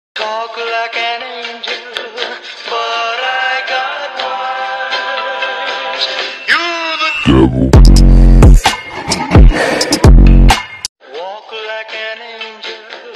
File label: halloween theme song remix